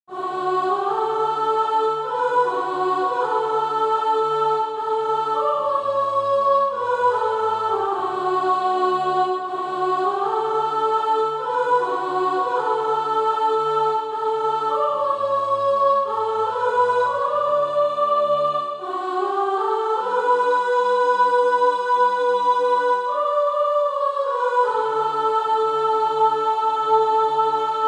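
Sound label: soprano; Christ Receiveth Sinful Men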